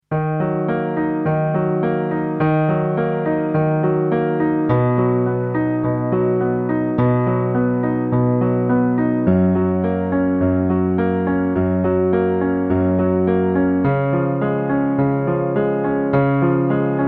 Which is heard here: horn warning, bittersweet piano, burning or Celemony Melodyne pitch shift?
bittersweet piano